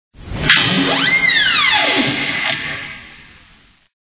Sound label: door